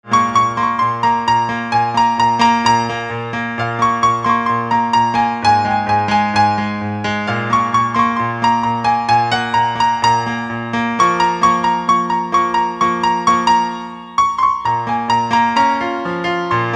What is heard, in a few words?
Piano